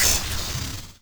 green gecko spit end; npc

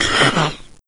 maggot melee; doom3

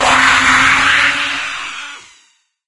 death, lostsoul; doom3

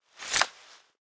unequip; weapons; props dane

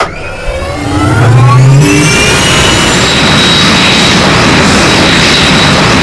turbine medium; acf engines

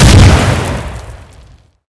tank shell; wac

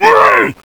zombie pain

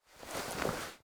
weapon holster

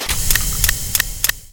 coilgun vent

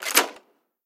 unlock, lockpicking

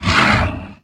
vox attack

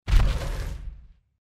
walk, power armor; clothing system

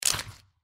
mantis arm attack; npc

